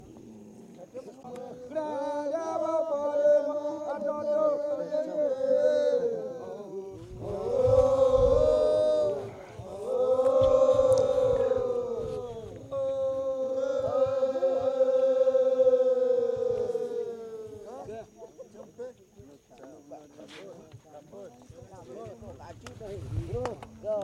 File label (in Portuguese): timbiras